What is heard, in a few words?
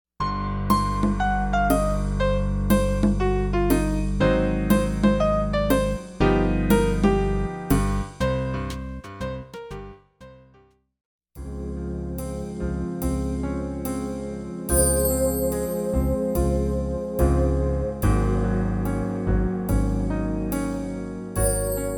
beginning jazz